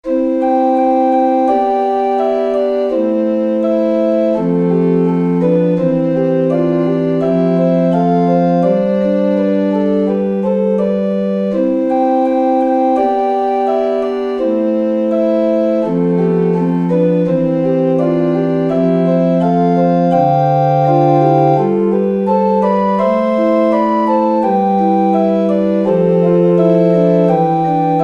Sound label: organ